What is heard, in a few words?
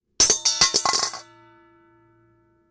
Throwing Coin Into Metal Bowl